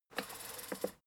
fader plastic stutter regular

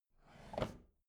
bathroom drawer open regular

